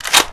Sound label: cheytech reload boltopen